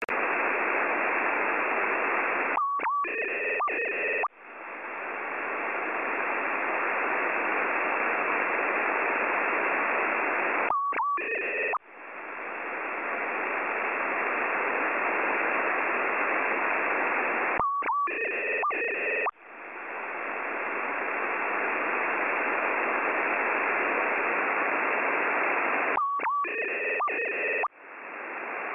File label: Weirdbeep